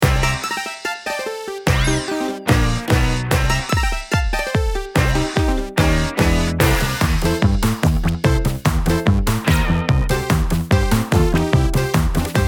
battle; valentine

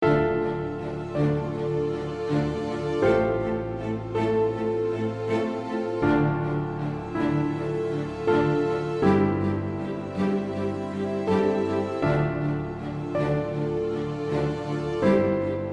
story sad; beasts

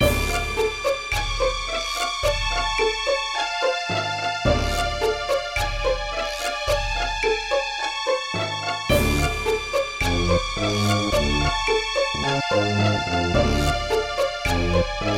story threatening light

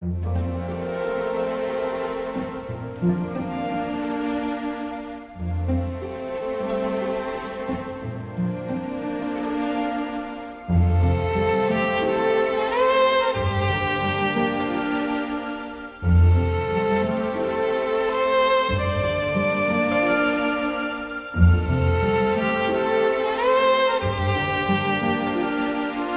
halloween story relief

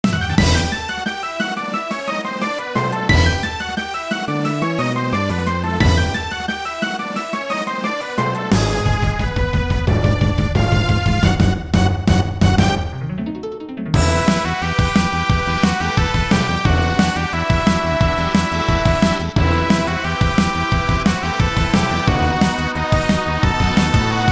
story climax

